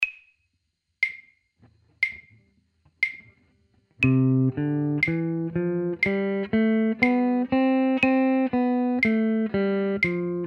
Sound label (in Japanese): doremi rensyu